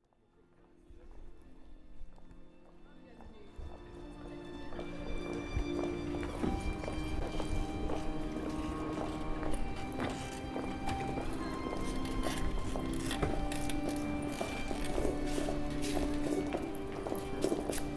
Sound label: kropeliner strasse by fountain violin and accordion early evening